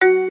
step; Kings Crown

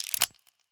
gambling mouse click; Wild Guns

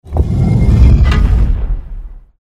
inoutro sfx; Stonehenge

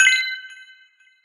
bonus symbol symbol blink; Hungry Shark; Wazdan

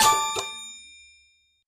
symbol collect; games; Aztec Gold Megaways

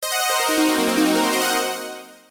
WIN; Caribbean Holidays

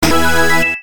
bonus chance; Lovely Lady

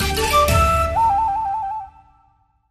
cascade win; The Golden City

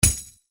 reelspin click; Route 66

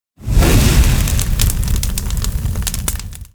expand wild; Fire Queen

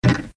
REELSET STOP; Sizzling Hot

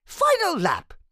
final lap; Racetrack Riches; megaboard

